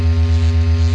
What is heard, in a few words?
generator; alienshooter